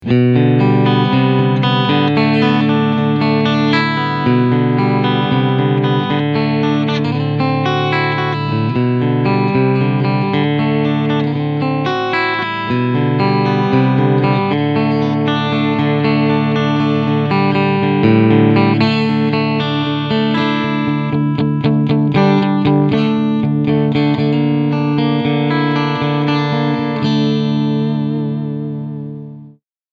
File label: Clean